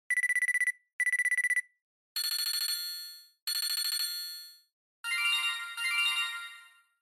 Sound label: Feature Phone-Ringtone